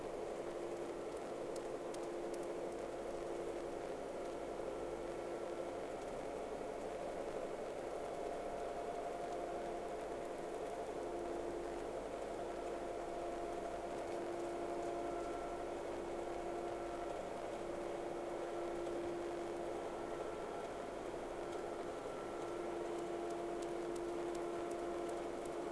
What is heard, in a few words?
Whales; SeaSnake